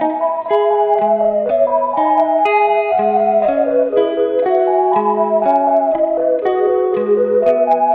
guitar and keys, Jazzmatazz loops

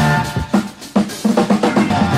unannounced guitar, funky drum loop; Drum loops

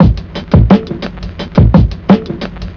big and brassy, boombap drum loops

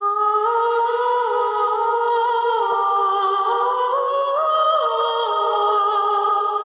voice distant and haunting; Loops; Sad

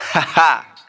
ha ha; Voice; ooh ahh

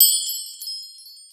stringy, Bells; Misc Percussion

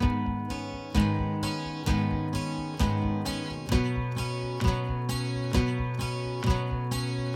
guitar, Sad

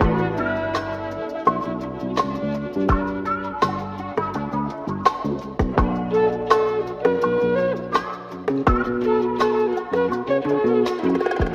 full of flutey goodness, Loops Phonky

